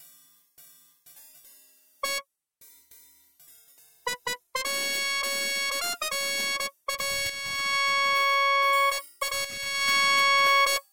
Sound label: Ending; Ristar - The Shooting Star; Genesis